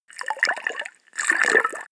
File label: bubble fast